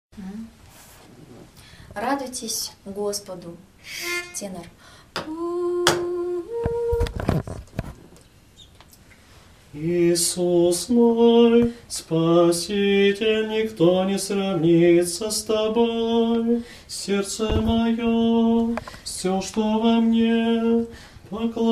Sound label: tenor; Radujtes Gospodu